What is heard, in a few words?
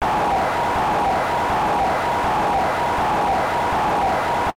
Crowd Noise; Mike Tyson's Punch-Out